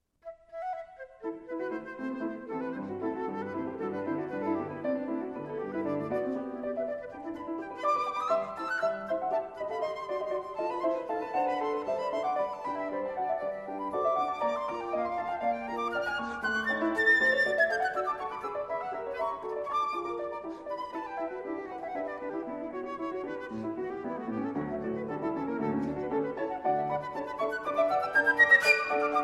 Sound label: Sonata For Flute and Piano - Mvt. 2. Scherzo Molto vivace